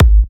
Kicks G#; markus hakala

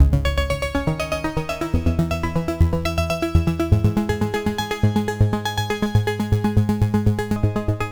NRG Arp, trance

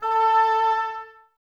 OBOES A, Woodwind Brass Ensemble; Wood Winds; Miroslav Vitous

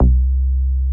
SNGL.BASS, Jungle Rave

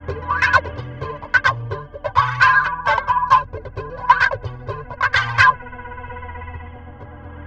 WEIRD WAH, Bizarre Guitar; Spectrasonics

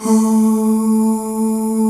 A2 MALE OO; Northstar - New Gold VOL-2

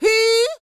D BIT, Vocal R&B Dance

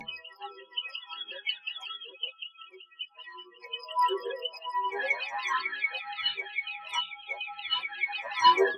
Nice Pluck, fx

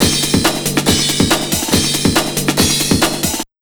Big Beat Drumloops, SPEED 140; USB Soundscan vol.25; AKAI

